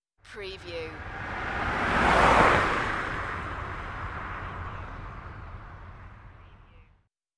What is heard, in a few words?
Car fast drive by; beanstalk audio